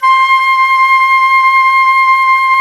FLT XFLT, Flutes; Club-50 - Foundations Roland

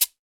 PRC Brazil Set; NorthStar - Global Instruments VOL-2

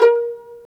STR PIZ VL, String Sections, Violins Pizz; Roland LCDP13

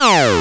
ZIP A4; Effectors

